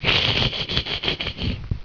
snicker

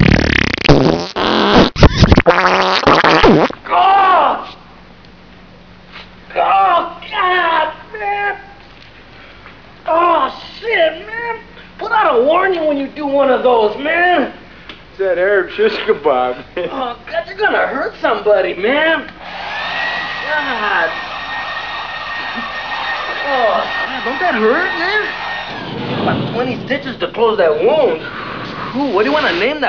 fart

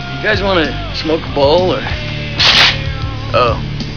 smok bowl

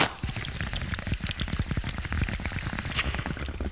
bong toke

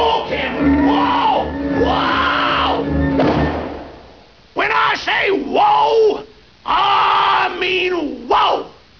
whoaa

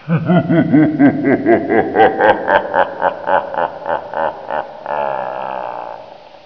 evil laff

